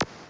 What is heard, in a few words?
erase; singe; Space Pirates HD